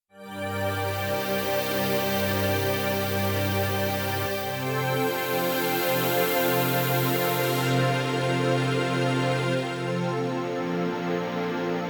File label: Korg Radias-R